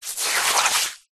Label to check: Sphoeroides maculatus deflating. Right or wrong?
Wrong